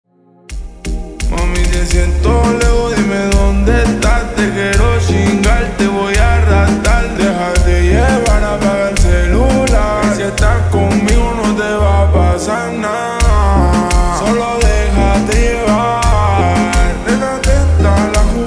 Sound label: Slowed Reverb